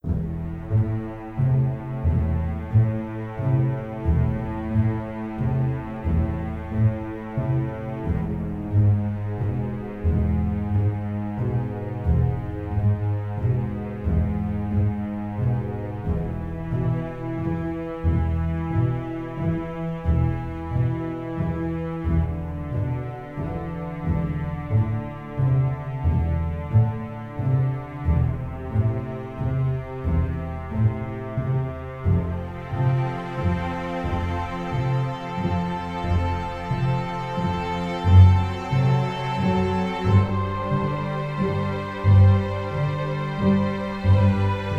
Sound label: Hammond; Dex